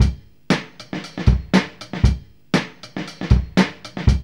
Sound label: jeep beat 118; Zero-G; Funky Elements